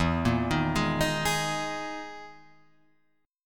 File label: E Major 7th Flat 5th down